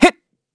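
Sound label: Vox Jump, Korean; heroes; Evan; kingsraid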